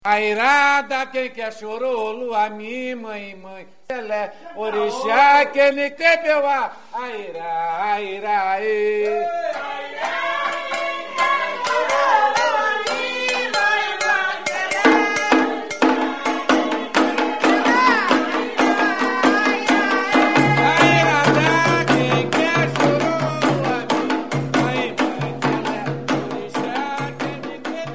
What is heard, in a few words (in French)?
bresil candombe